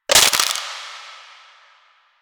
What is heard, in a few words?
Bone Break; Finisher; Warframe; Kuva Lich; Finishers